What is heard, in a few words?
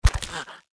feral ghoul foot run, npc; ghoulferal